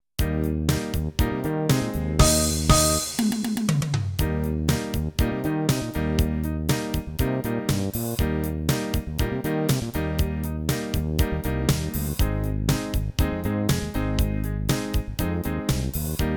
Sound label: first blues